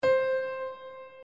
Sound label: Dynamics fff